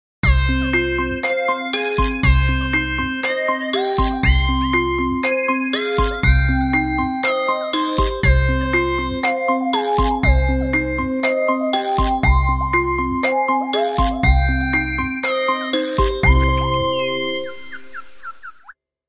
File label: ringtones; Condor; C8; FallingLeaf